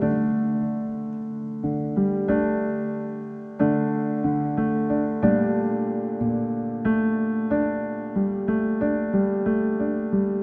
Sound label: Succession 92 Dm Piano Chords, Ambient Piano Loops; C and Dm Night Mood